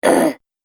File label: Goblin Little Effort, monster; horror